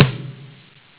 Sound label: fire